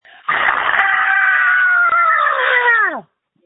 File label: Scream